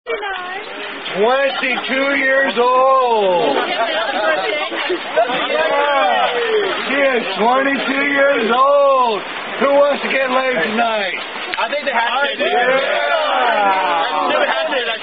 Covering With Cake And Then Singing Happy Birthday; Fuck You